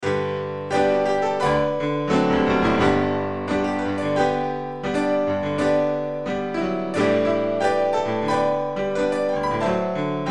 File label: only trust him, instrumental hymns